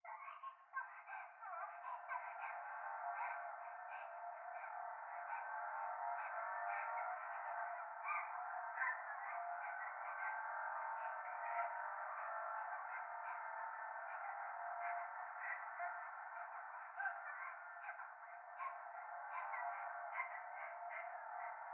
トイレ 可愛い喘ぎ 車の中

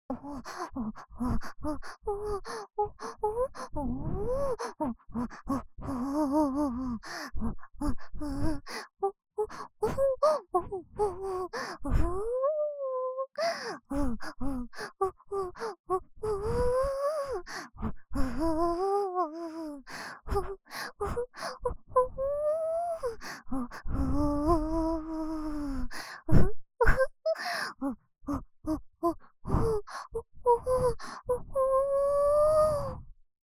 オホ声

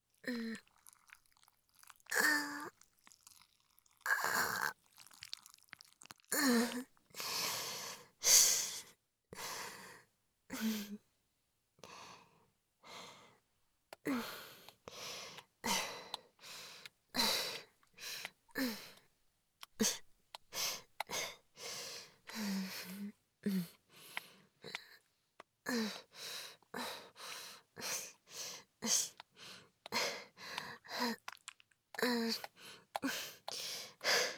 挿入 喘ぎ; SEあり

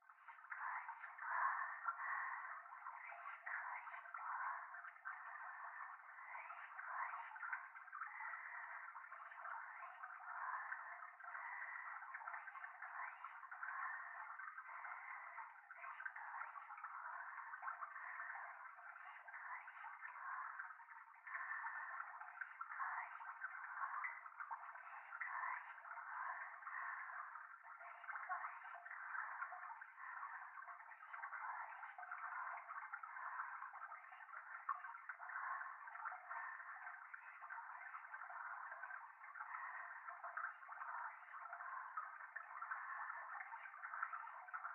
トイレ しこしこ 川のせせらぎ

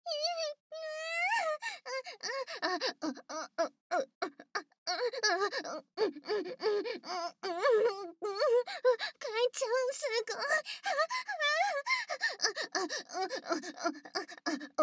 騎乗位喘ぎ

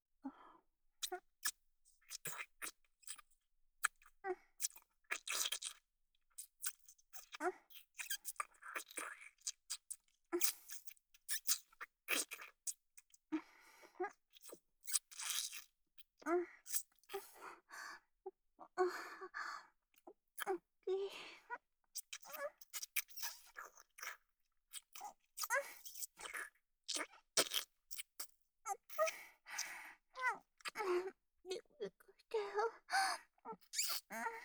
フェラチオ; SEなし

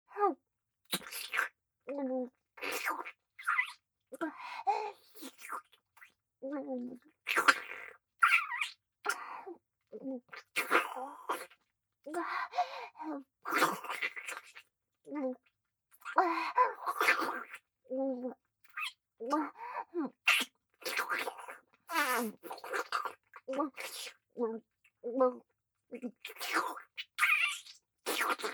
フェラ ふつう

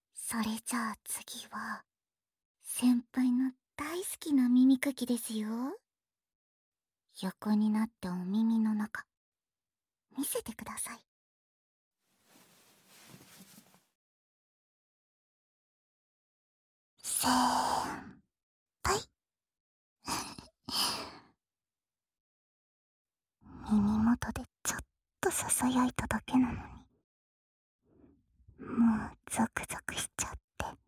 あまあま責め耳かき 反転, ASMR

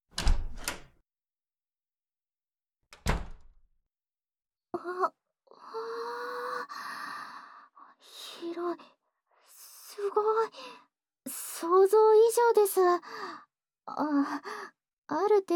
愛情込めて……フェラしちゃいますね？（お風呂で亀頭責めフェラ）

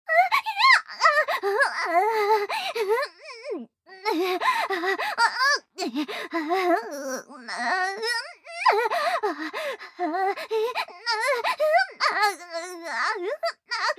喘ぎ声 （騎乗位・激しい）

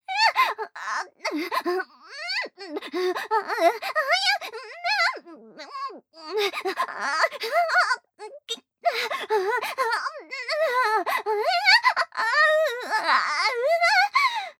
喘ぎ声 （正常位・激しい）

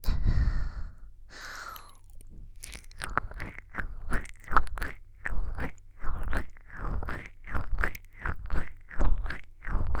舌を擦りつける

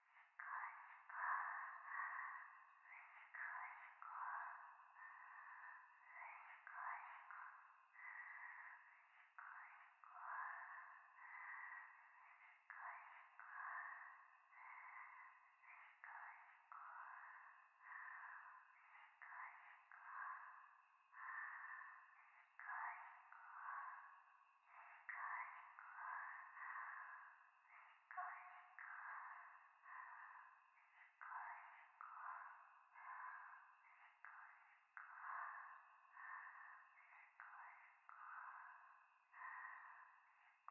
教会 しこしこ 山奥夜

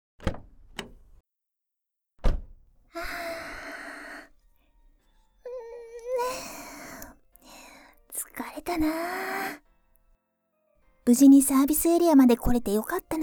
ちゅーちゅーASMR

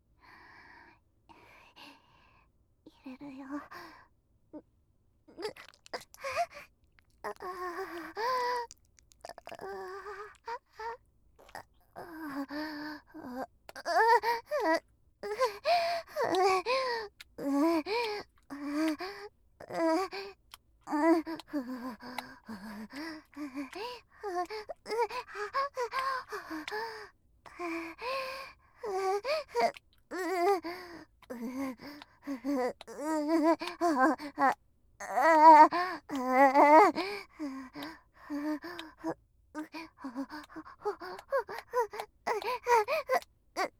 挿入 喘ぎ; SEあり

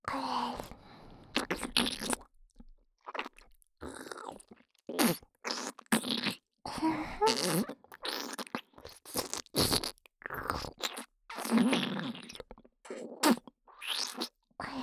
無垢 ねっとり左耳フェラ; フェラ素材

